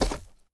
floor spikes deploy; Rust

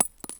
Inf Weapons; Red Orchestra 41-45

